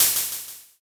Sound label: open hat